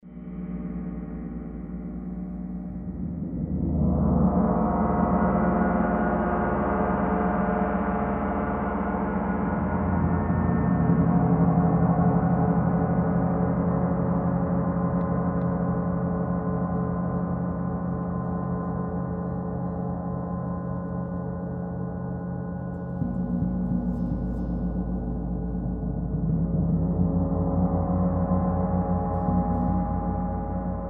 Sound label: Gong Wave